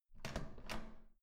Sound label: door handle use